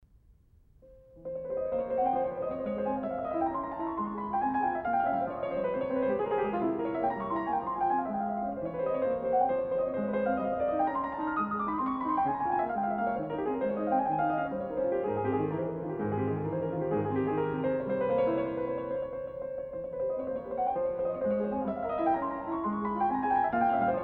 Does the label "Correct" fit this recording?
No